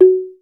SLIT DRM, ETHNIC PERCUSSION; AKAI S6000 CD-ROM - Volume 5

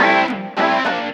Metafunk, Leads And Riffs

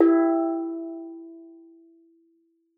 steeldrum E3; Xsample VOL-4

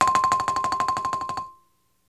BALAFON, African Melodic

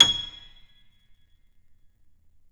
PNO FF; Synclavier Sampler Library

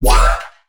bass hit robo cricket B; Virtual Riot

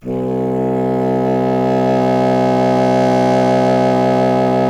C. Bassoon, solo instruments; Miroslav Vitous - Solo Instruments VOL-1

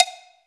cowbell; AKAI S6000 CD-ROM - Volume 5

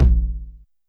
Tom Analog, YAMAHA RM1X

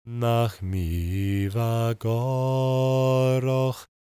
GAELIC, World Voices; ILIO; Vocal Planet

